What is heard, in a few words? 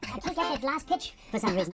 YODEL YELL, World Voices